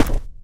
charger run left, footsteps; Left 4 Dead 2

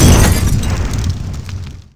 cocktail molotov; BaboViolent 2

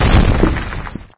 boulder impact hard, concrete; physics; Garry's Mod; hl2

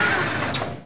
turret end; Emperor - Battle for Dune

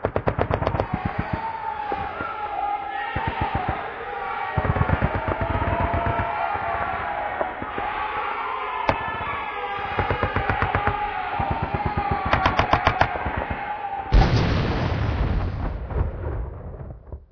city riot; Garry's Mod; hl2; streetwar

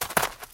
jump; Ace of Spades

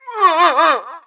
normal dying; Emperor - Battle for Dune

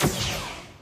bomb; AssaultCube; Alien Stars

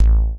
label counter; AssaultCube; Alien Stars

